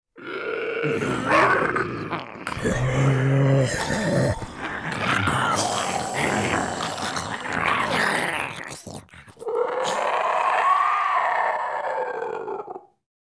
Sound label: Zombie horde apocalypse